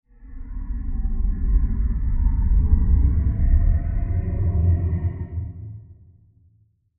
Diffuse And Scary Transition; Scary Soundscapes; Horror; CraftedSignals

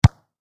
Soccer Ball Kick Hard; Sports

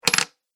Gold Coin, money; Money Sound Effects

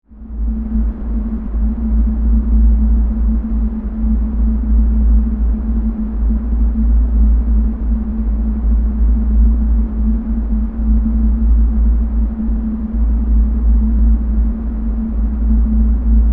Spaceship in-flight ambiance; Spaceship In-Flight Drone; Spacecrafts (Sound Effects:Science Fiction)

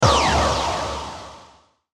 BURST LASER, Burst Laser Delay; Production Element Imaging Element Accent Transition; Bursts

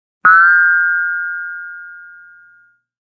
Spring; Rollover or button sound; Springs; Multimedia

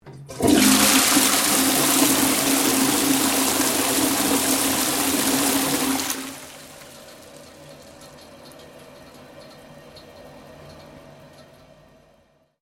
TOILET AUSTRALIA FLUSH; Toilets; Bathroom Sound Effects; Bathroom (Sound Effects:Household)

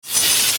Ascend Static Bright Swell Phase; Blastwave

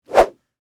Whip Whoosh Coax, Cable; Foley; Sound Effects:Objects; BlastWave

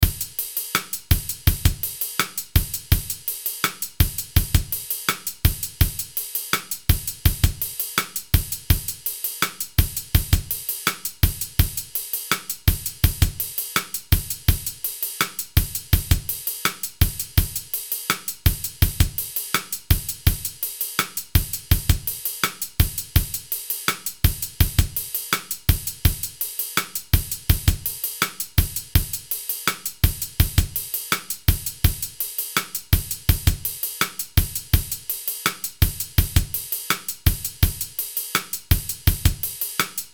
Beat Loop R&B Hip Hop Paradiddle Down Tempo

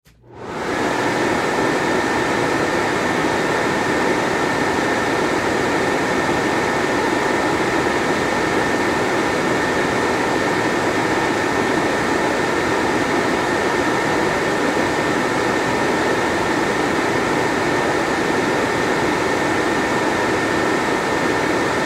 Fan Air Machine On Run Off; Foley; Fans; Electronics; Blastwave

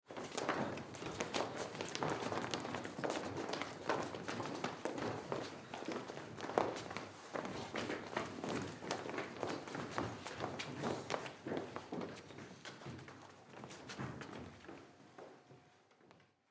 Crowd Movement Walk In Sit Down; Crowds; Human; Blastwave

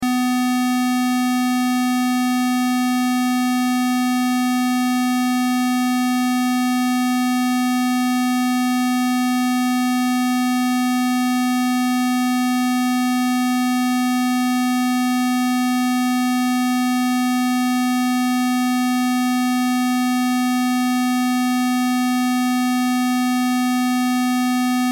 Test Tone Square; Wave 250Hz 60 Secs -18Db Loop

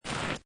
Snow And Ice Hard Snow Or Ice Grate; Weather; Lynne Music

